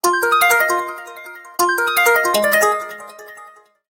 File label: Animato Tone; Samsung SCH-W420; ringtones; Simple Bell